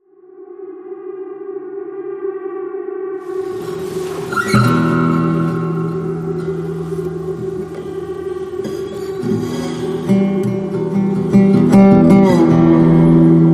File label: Suspense